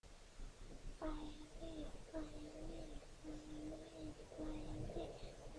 violin

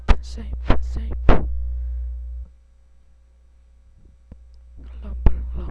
rumble

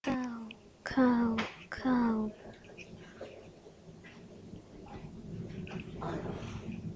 cow

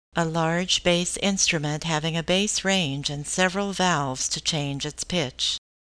tuba

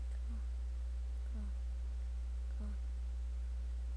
gun

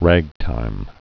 ragtime

